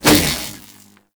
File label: Prod Whoosh; Warframe; The New War; Drifter